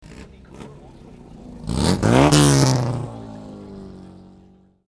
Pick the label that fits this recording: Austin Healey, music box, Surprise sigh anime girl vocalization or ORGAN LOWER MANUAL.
Austin Healey